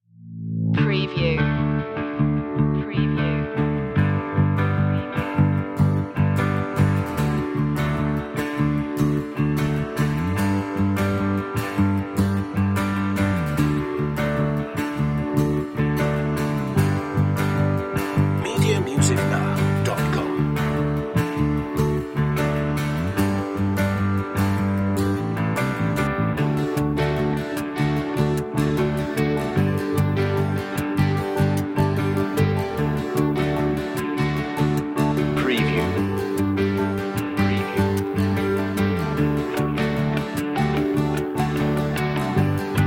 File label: inspiring no drums